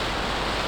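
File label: shell Fly; snaryad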